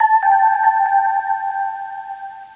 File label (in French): perle tombe